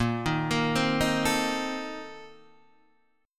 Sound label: Bb Major 7th Suspended 2nd Suspended 4th, Guitar, Open D; 8,6,8,6,6,7